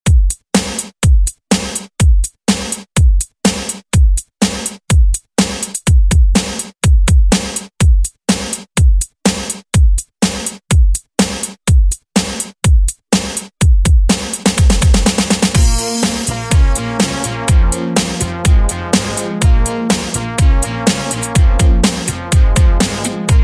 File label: Pop Redrum; Deejay Hit